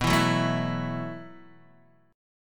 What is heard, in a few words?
B 7th Suspended 4th B down Guitar Open D